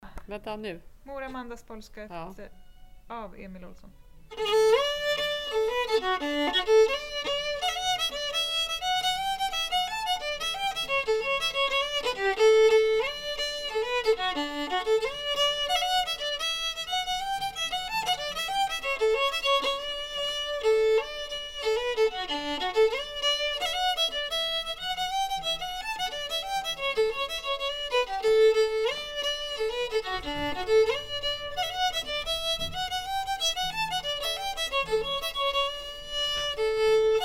Mor Amandas polska

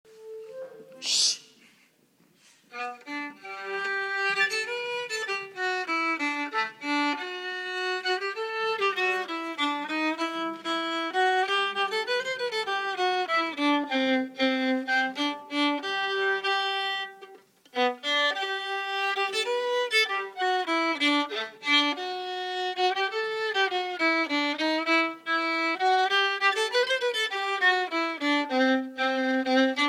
Dromslott 2a Stamma

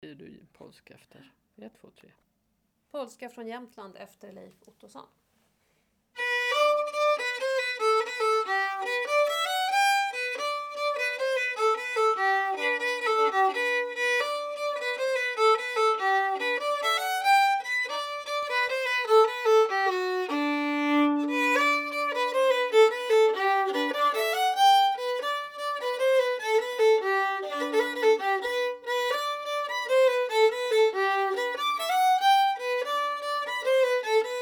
Polska från Jämtland

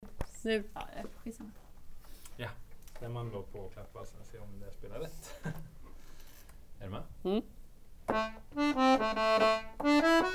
stämma Klappvalsen

